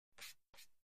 Cards Scrape Table; casino games card games

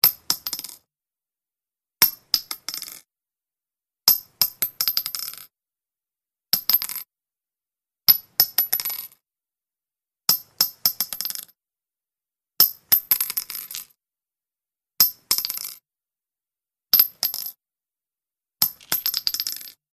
Shell Casing Hits St, handgun

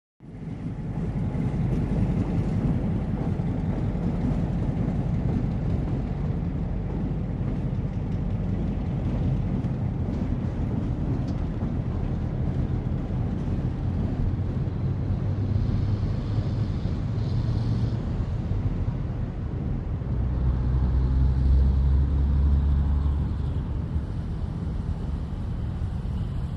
Train Coupling, steam

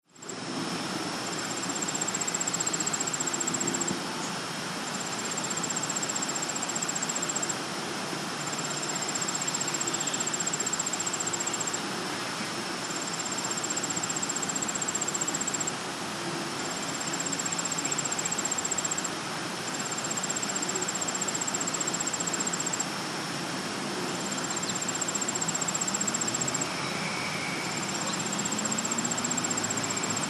Sub Dist Traffic

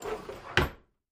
drawer close; drawers

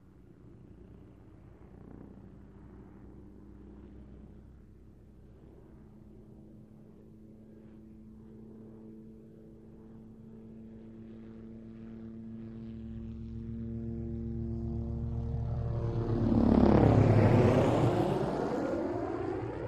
OH6 Loach Dive By Away, little bird

